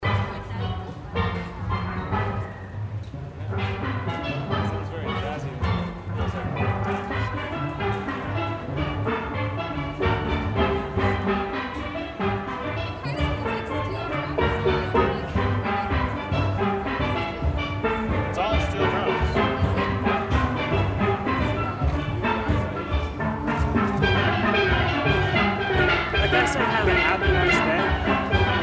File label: steel drum; the distinctly caribbean rhythms of calypso--that ol' 3+3+2; carnival in cambridge